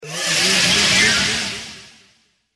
enter portal; the arena

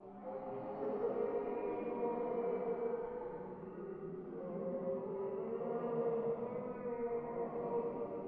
MOANING, poltergeist

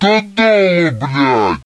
attack, troll

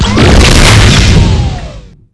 electro

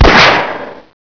shot

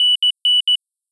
beeper

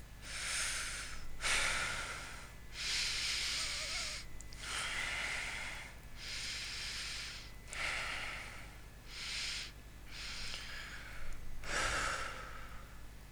breathe slow

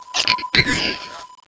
child pain

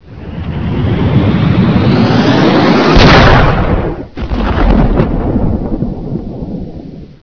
beam in

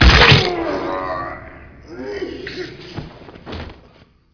grunt legbreak

